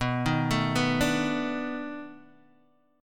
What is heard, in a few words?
B 7th Sharp 9th B down Guitar Open D